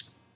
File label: coin